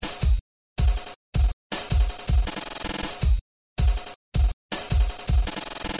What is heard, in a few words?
drum loop